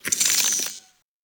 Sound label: Hi Tech Clicks; Cave; Ambience; Warframe; Stalker